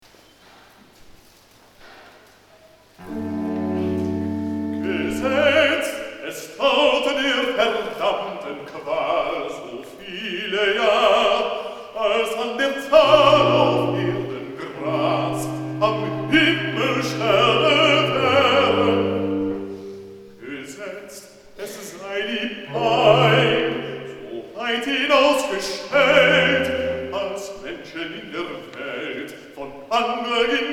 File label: Recitativo B; BWV020 O Ewigkeit, du Donnerwort